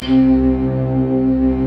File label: HARPS, Harpsiflute, Harpsi Stack